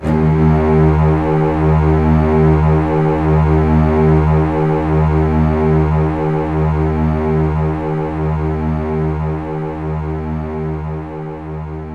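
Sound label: String Loop D sharp 1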